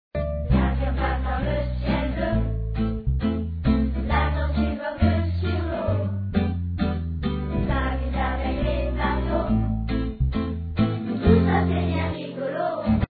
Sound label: sax